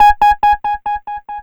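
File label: synth, 140 BPM, Drum & Bass; Ueberschall; Drum & Bass Resonance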